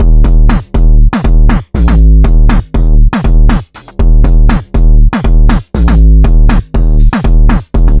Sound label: rim hip 120bpm, Downbeat Dance Grooves